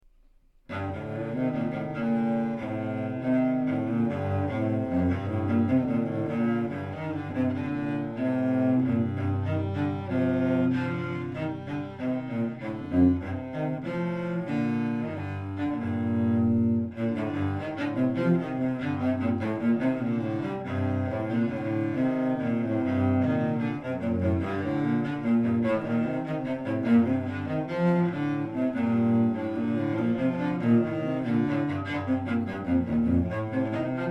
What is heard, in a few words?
Etude for 2 Cellos